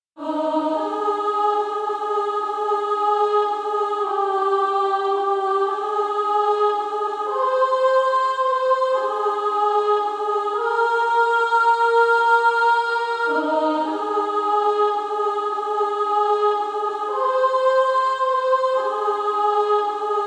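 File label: Female Choir, soprano 2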